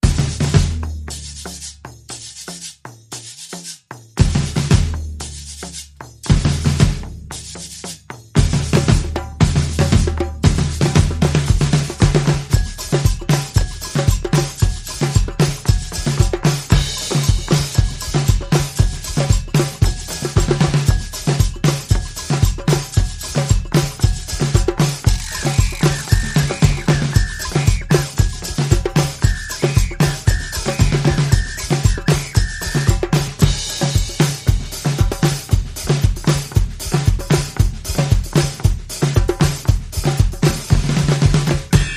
No Timbale

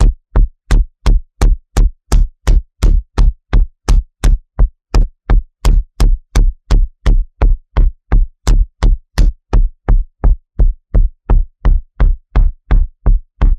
Demonic Steps Bass Rhythm

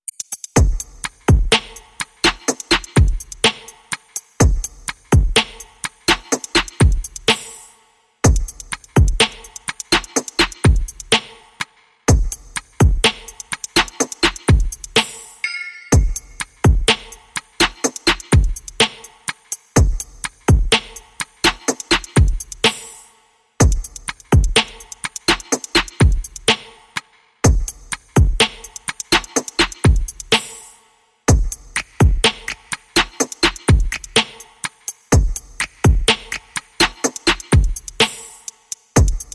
Beat Only

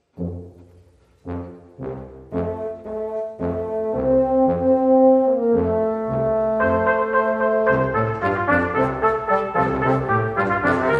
A Brassy Jingle Bells, No Flute No Drums Mix

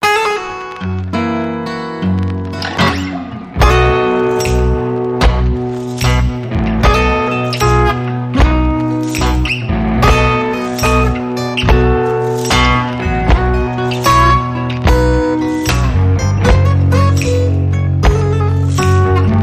Blues

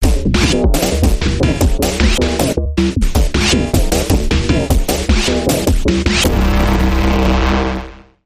Buzz Electronic Bumper Reversed

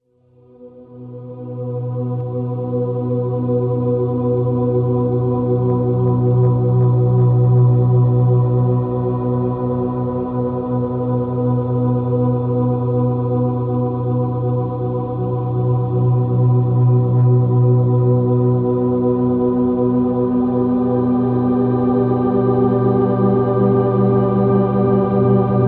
Ambient

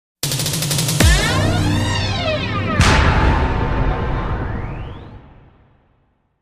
Drums & Bangs

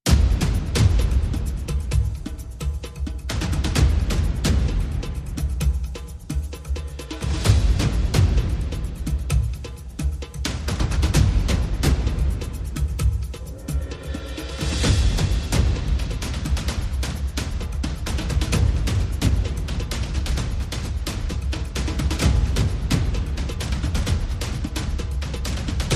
Perc and FX

